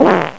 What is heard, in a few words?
fart